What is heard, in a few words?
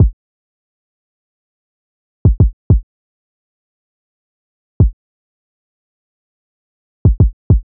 Drum Loop, 100 BPM Kick; Cymatics; Vibes II Drum Loop Collection; Brilliant Drum Loop